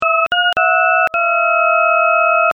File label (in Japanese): 人工ニワトリ; 鳥の声研究室; ニワトリ VS 人工ニワトリ